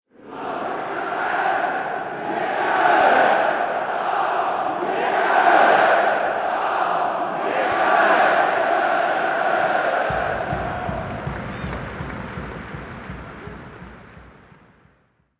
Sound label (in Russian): vykriki bolelshhikov